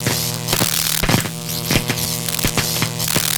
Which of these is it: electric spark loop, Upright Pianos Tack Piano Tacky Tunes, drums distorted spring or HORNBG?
electric spark loop